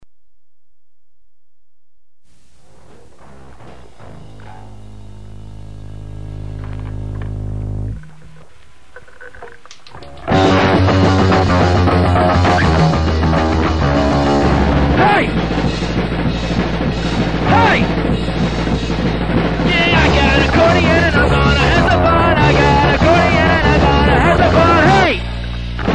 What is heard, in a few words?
accordion